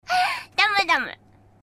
polka

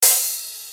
op hihat

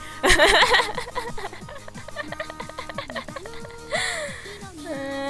ehehehehehe

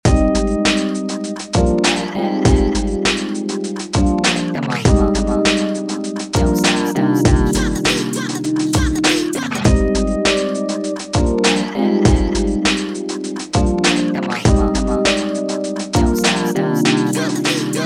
GAME OVER

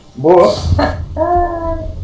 boahaha

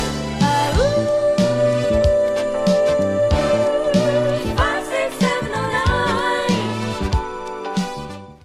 awwoooo

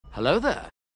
laughhhhh

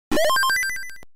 tetris clear; nes

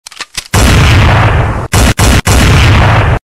sniper rifle shots sound effect

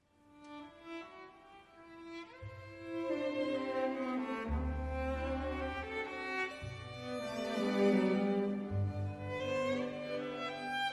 radio musik

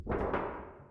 move in vent; among us

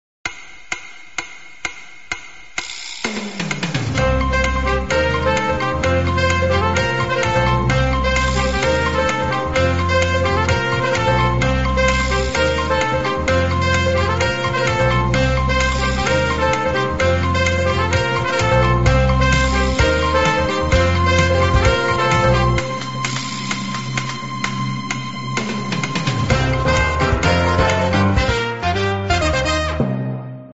countdown theme

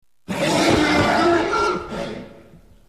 angry grunt

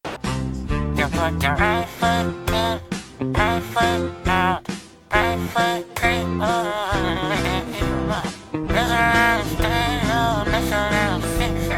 villager singing

